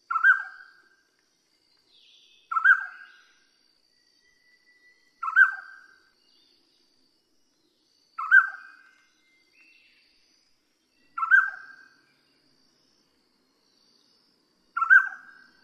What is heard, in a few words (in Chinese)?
大彎嘴; 大彎嘴畫眉、斑胸鉤嘴眉; 畫眉科/留鳥